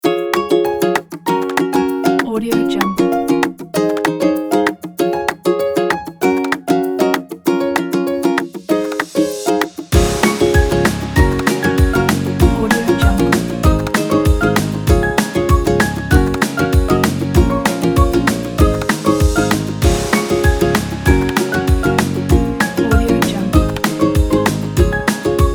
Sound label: happy kit